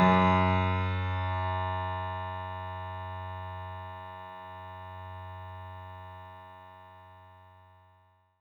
F#2, synth; 90 s Sample CDs; NiceBeats; SynthLine VOL-23

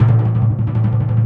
Orchestral Perc, Timp FX

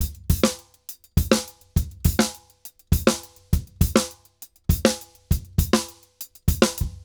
Drums 136, Festa Latina, Drums & Percussion; Best Service; Festa Latina VOL-1

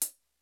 YAMAHA RY30; Rubber Chicken Software Sample Bank

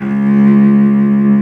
CELLO C#2 L, SOLO STRINGS; Greytsounds VOL-1

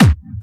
hardbassdrumz, breakcore; Bazzdrumz

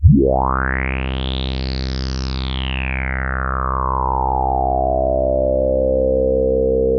TS10 Analog, Synthesizers; Soli-Music; World of Synthesizers VOL-1

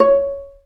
Vla Piz C#4; Digital Sound Factory Virtuoso

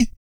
DEEP BREATH; Spectrasonics; Vocal Planet; Blues Soul

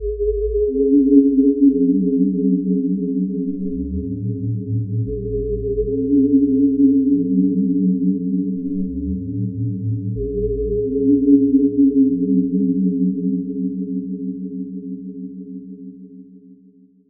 Space Melody, Spacey; Zero-G Ambiosis

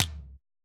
perc, Roland MC-909

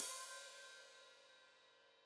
Hat Foot Open, Hi-Hat; Deeply Sampled Drums

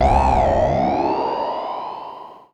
Simple OSC Spring Reverb

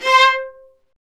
STR, Vas Marcato; STR Vas1 Marcato

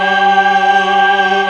MELLO CHOIR

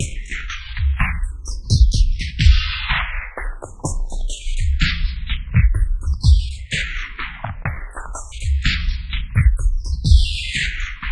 FILTERS, Dark Side Of TripHop

